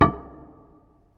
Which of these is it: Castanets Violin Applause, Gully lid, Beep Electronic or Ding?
Gully lid